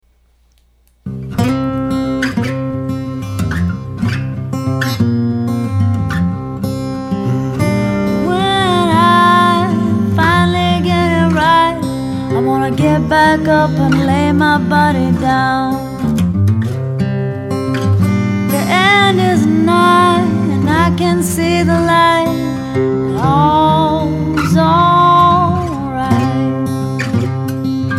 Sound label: Desert